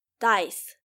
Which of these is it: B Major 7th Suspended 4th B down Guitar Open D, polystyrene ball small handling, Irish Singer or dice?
dice